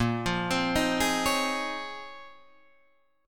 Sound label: Bb Minor 11th Bb down Guitar Open D